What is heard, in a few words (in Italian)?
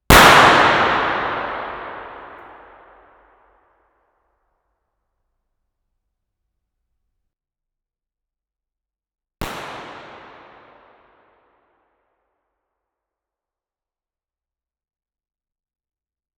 Spari Binaurali; S Ludovico; ComunePR